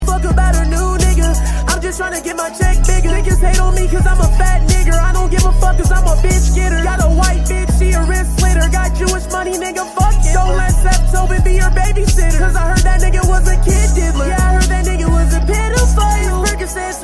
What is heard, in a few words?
crying in the kitchen